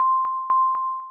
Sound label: heal ping; pings